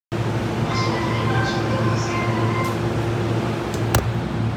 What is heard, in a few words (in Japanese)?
JR埼京線南与野北, train sounds